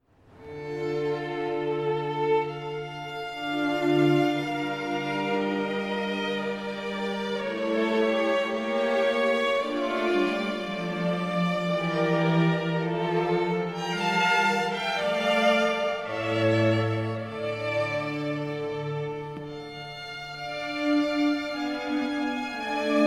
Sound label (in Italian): Sinfonia a quattro Grave